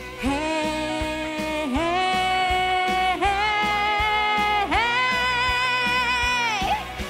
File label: Heyyyyyyyyy HEYYYYYYYYYY